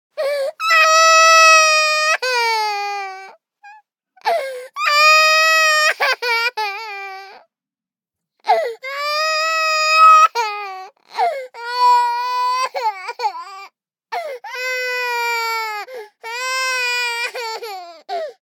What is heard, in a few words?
BABIES CRY Girl 24 months Heavy; Baby Boom